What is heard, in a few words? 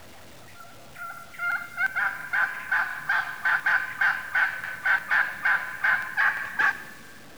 excited yelp, turkey; hunting